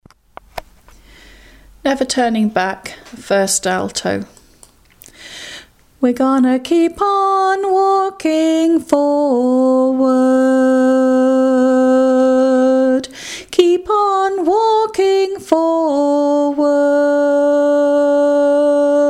alto

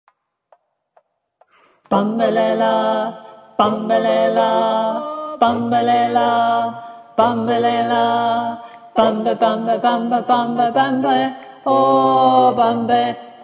Tenor

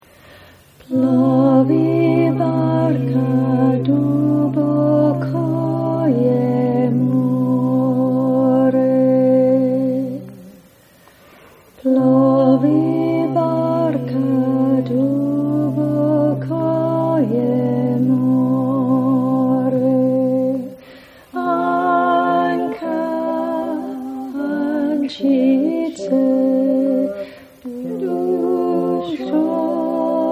Alto, choir

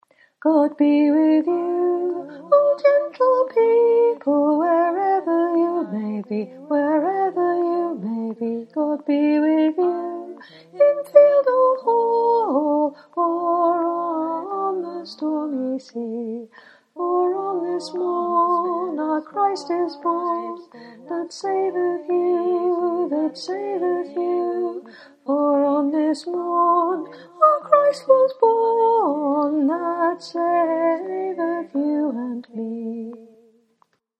top, choir

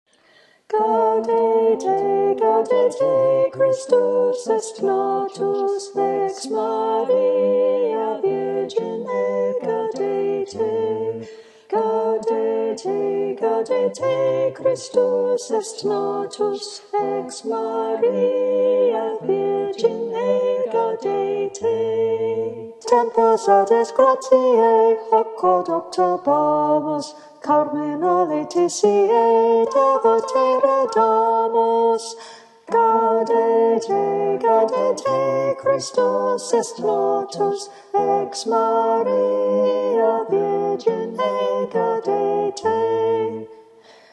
Soprano

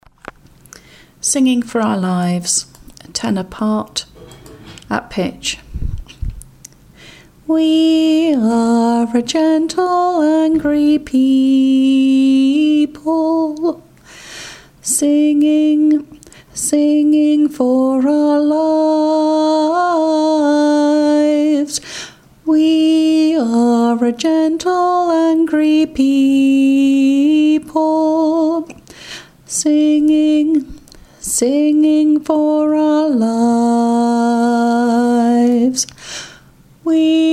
tenor

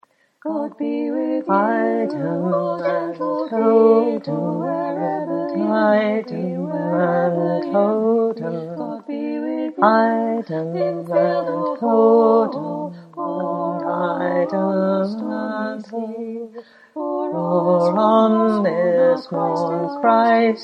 bottom, choir

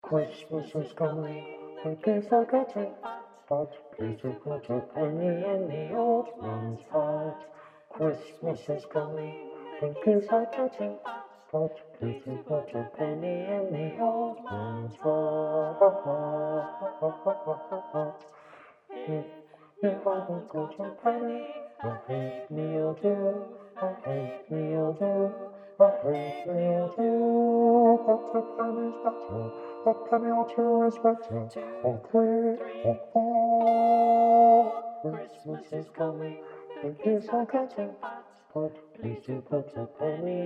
Bass

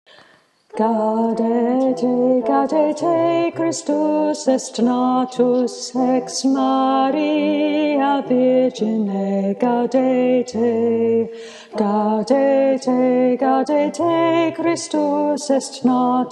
Tenor

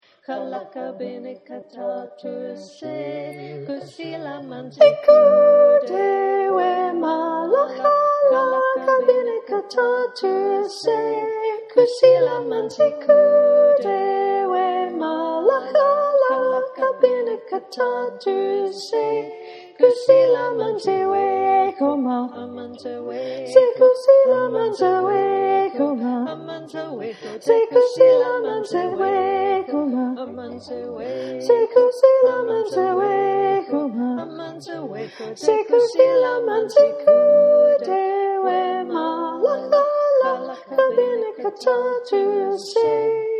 Soprano

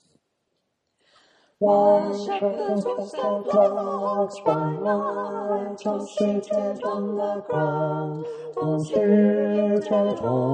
Bass, choir

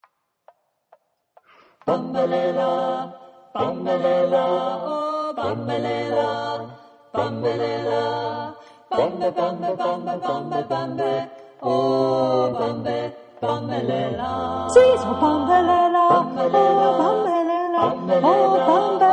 Bambelela, choir

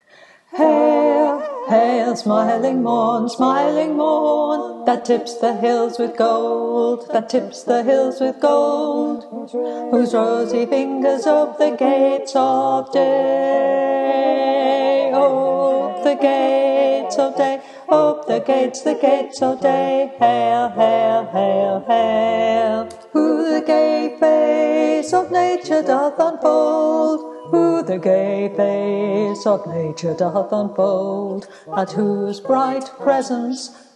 Tenor

